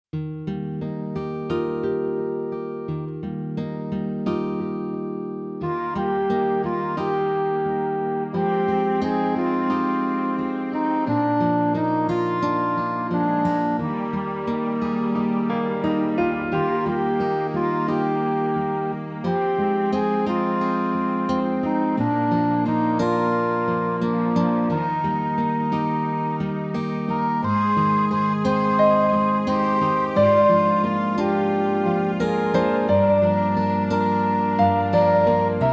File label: instrumental